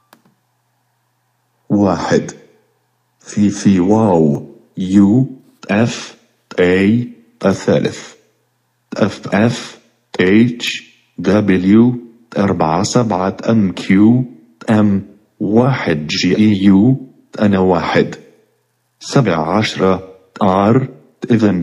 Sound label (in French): Portait arabe avec voix